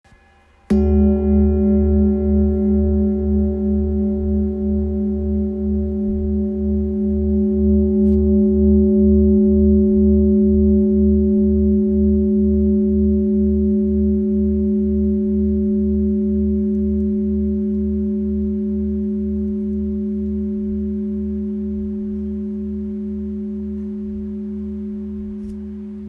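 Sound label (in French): Bol ramgandi do diese, bol chantant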